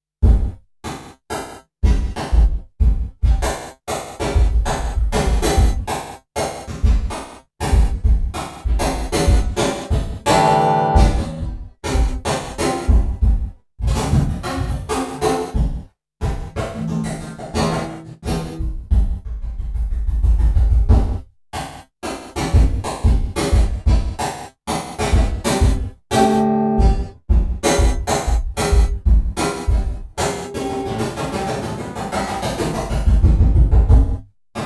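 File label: acoustic guitar drums GATE verb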